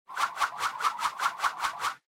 Motion Swish High Short; モーション スイング; 高 短

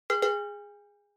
Cowbell (Dry)

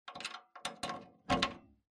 PC Hardware Earphones Plug In

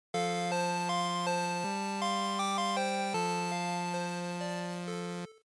Shooter Game Over, bgm

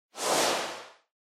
Scene Flashback Short

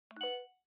Smartphone UI Sound Speaker

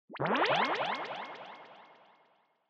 Scene Fantasize Delay Short

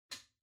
Wall Outlet Far Dry Lock

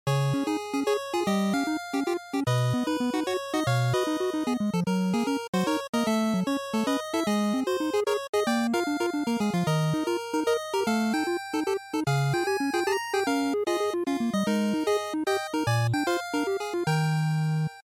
NES Shooter Title

